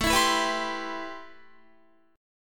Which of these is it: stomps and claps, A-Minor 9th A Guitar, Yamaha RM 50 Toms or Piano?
A-Minor 9th A Guitar